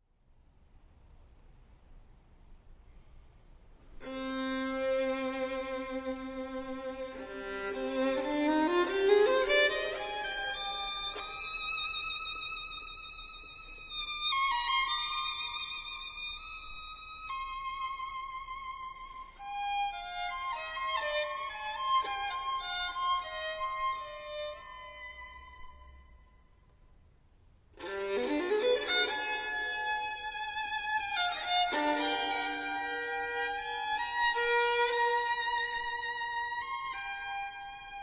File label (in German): Violine Solo